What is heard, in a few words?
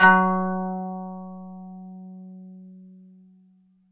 KANUN F#; SoundBytes; Early Patches; KAN CHOR BIG